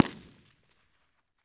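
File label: PERCUSSION, Guns Tuned; Roland L-CD701; Guns & Glass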